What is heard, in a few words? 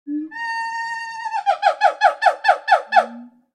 gibbon